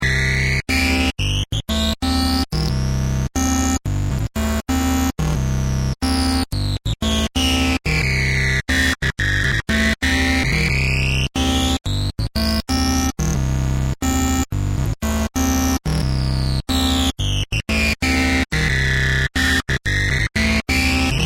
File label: wasp bass